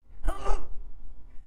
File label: Mod Rebuild Grunt; dialog